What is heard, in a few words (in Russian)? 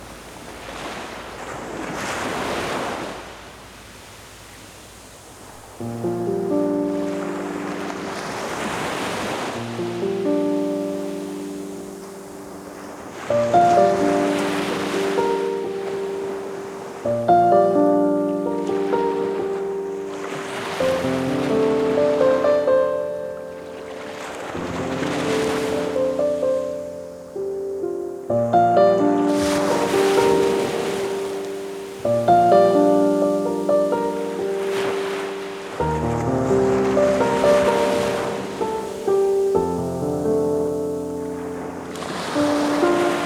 moj okean zvuki prirody